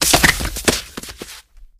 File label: Body Falls On Cement